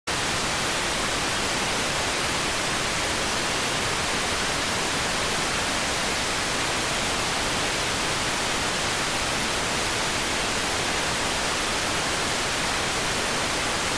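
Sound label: radioteletype receive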